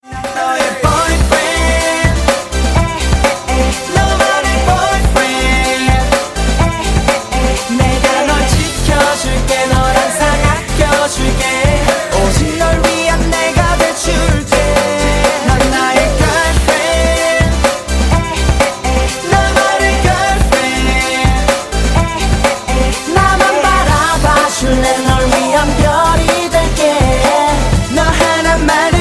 k pop

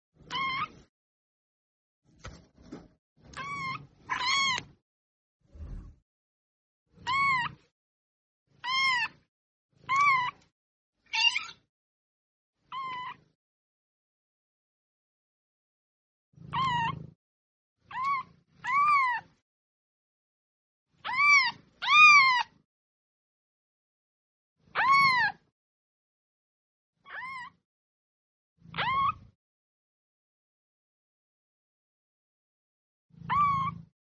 kitten meow

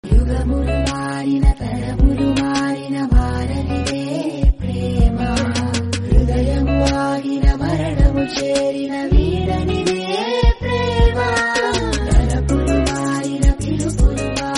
telugu song; 30 weds 21

